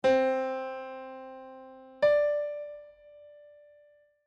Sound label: music intervals major ninth